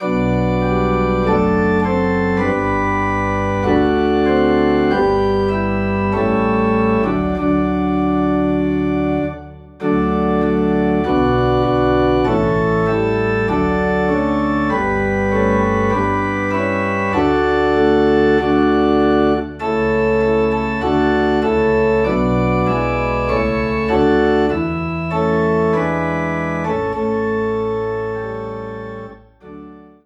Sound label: Organ in D; Holy Holy Holy